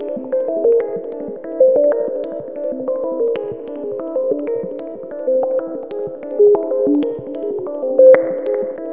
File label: WHOLE TONE, WEIRD SPACE; Zero-G Ambient 1